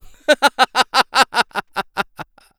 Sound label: laugh; Eternal Return; ENG